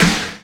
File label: Snare; VEC1; Club